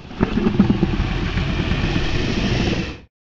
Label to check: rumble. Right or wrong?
Right